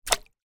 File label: fishing sinker tackle hit water plop